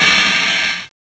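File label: HI-HAT OPEN; CMI Sound Library Rev 1.3; CYMBALS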